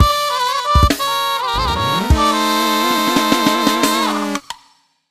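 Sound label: Jingle lose; Game Builder Garage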